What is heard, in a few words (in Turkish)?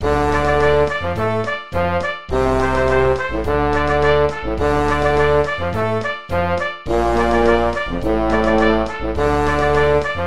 film muzigi; bilgin bora; yorgun savasci